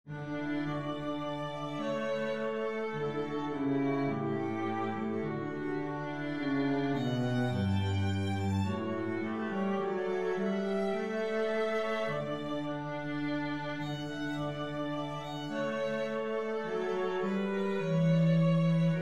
arietta, violin; matteis

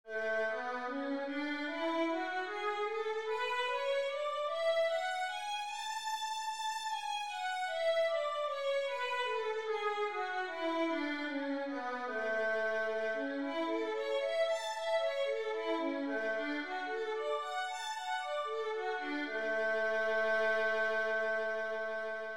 violin a major, scales 2 octave

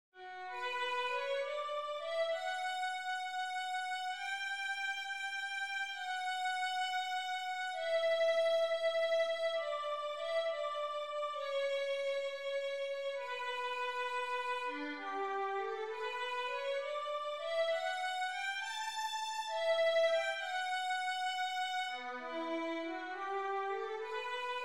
moldau violin

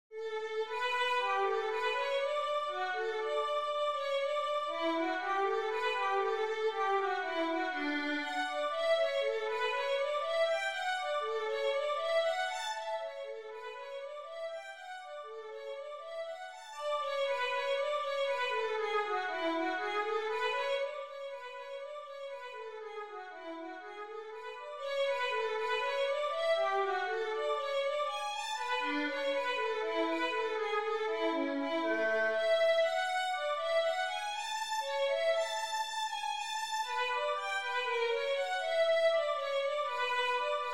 etude, violin

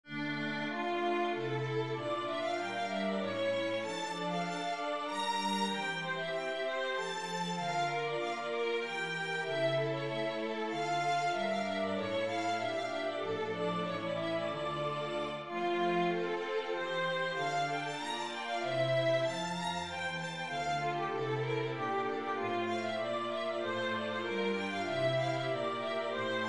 rondeau full

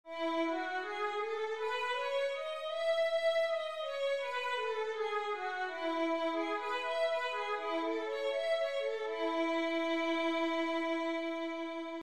violin e major, scales 1 octave

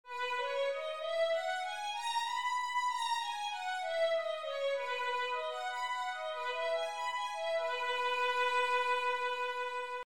violin B major, scales 1 octave

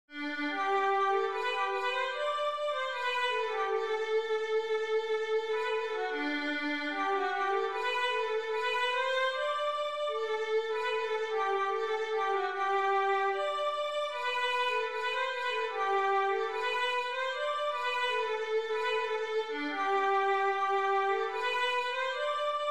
simple gifts violin

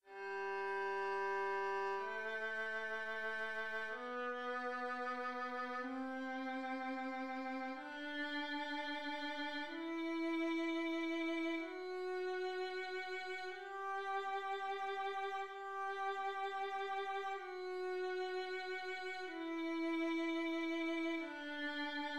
gmjscl wholenotes, violin

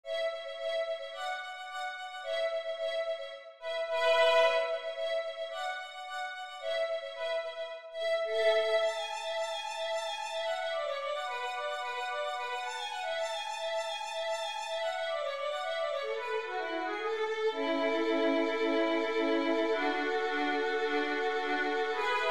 fiddle violin

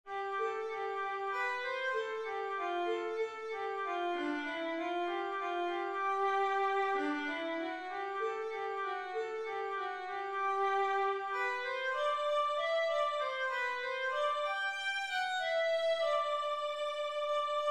Columba violin

